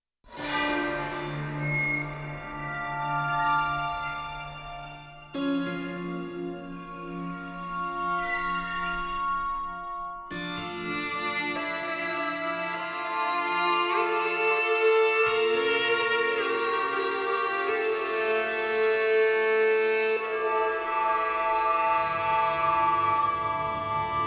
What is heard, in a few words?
Cementary Explorer Main Loop; Toussaint